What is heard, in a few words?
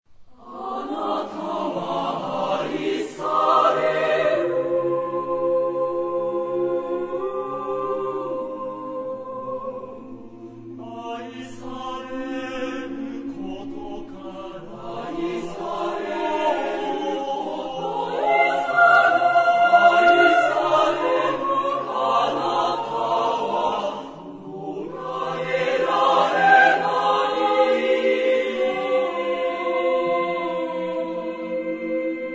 chorus; Matsumoto Nozomi